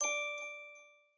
special card received; Skillzgaming; battle royale desktop

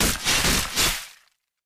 attack; Skillzgaming; battle royale desktop

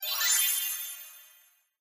xp filling; Skillzgaming; fruit Blast 92 Desktop

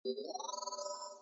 reel start; Rabcat; Classic 243

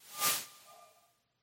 mult collect fly; hades River Of Souls; Fantasma

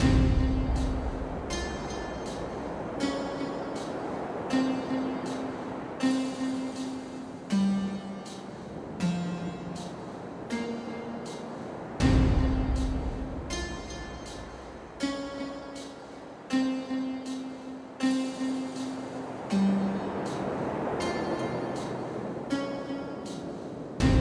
Base Loop; Temple of Luxor; genesis

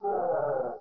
hero defeat; Skillzgaming; battle royale desktop

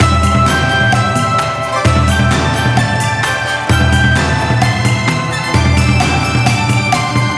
Win Summary loop; Long Mu Fortunes

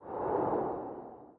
nebula flies; Skillzgaming; gems odyssey 92 desktop

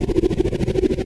Wheel Loop; Wicked Tales Dark Red

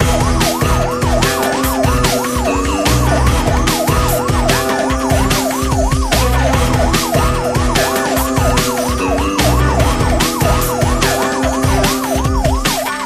Big Win Music Tally; Mahi Gaming

